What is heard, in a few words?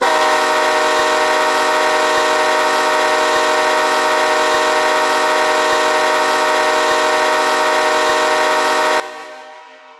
k5lla, horn; run 8 v3 horn pack